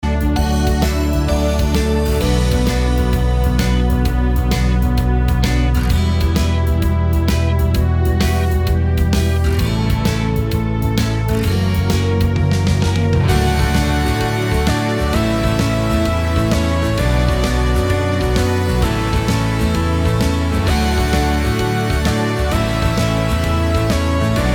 ballabile hully gully

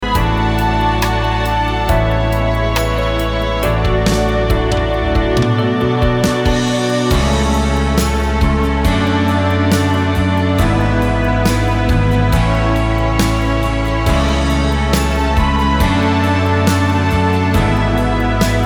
live finale strumentale

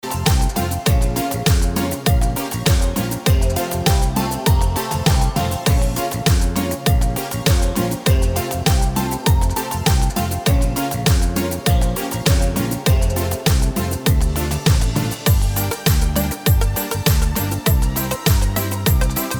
cumbia

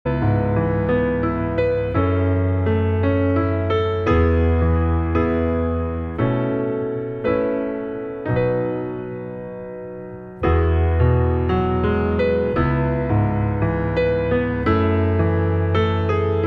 solo pianoforte

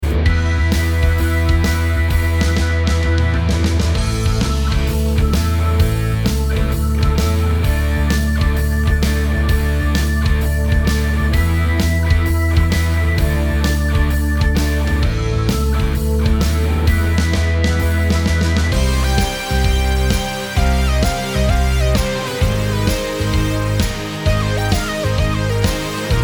rock ballabile hully gully

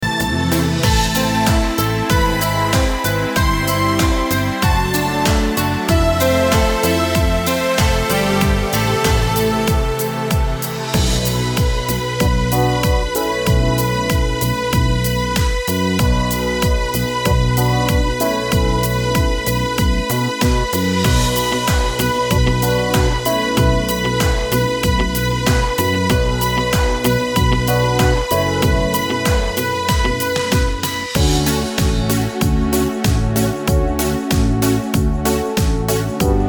rock ballabile

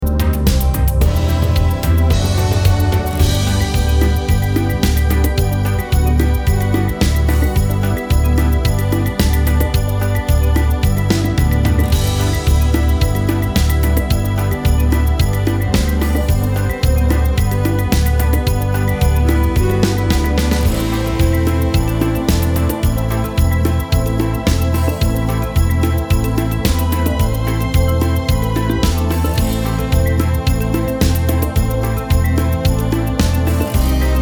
vers cumbia

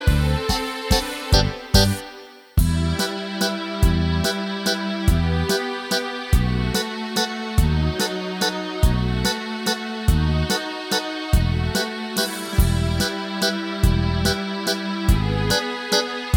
celebre mazurka variata

